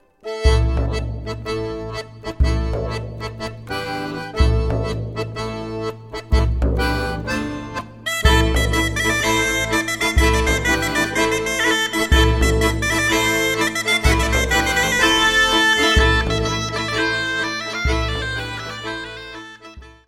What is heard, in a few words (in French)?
Matelotte - Belgique; La vielle Matelotte